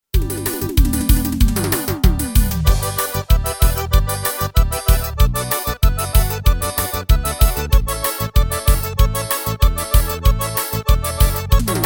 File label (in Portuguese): ritmos korg pa500